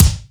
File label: BRITE KICK